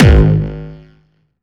Hard style kick

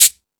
Beat Box, E-MU Pro-Cussion; drum machine

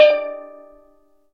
steel drum; E-MU Producer Series Vol. 2; More Studio Essentials; Composer

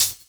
Hhat, Hihat; Equipped Music; Loungin House

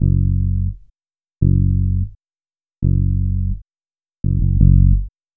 Bass, Trip-Hop Loops; Sonic Foundry (Sony Creative Software); Rondo Brothers

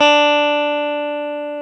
GTR, Dual Guitar; Club-50 - Foundations Roland

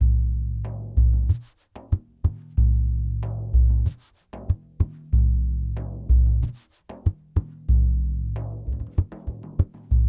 Lg Frame, World Percussion

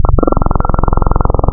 form, wavetables, Classic Synths

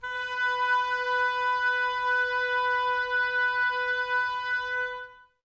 OBOES B; Miroslav Vitous

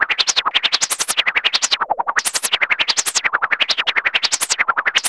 RUSTLINGS; Zero-G Ambient 1; PULSATIONS

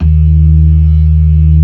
LOW MAN, organ combos; Keith Emerson Signature Series

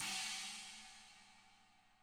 china 20; ILIO

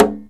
PERC KALIMBA

